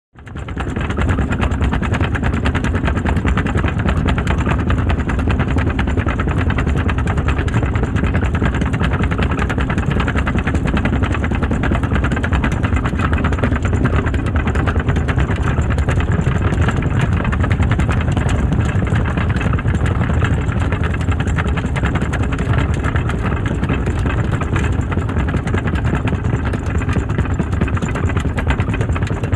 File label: Flat Tire Car Running, blowouts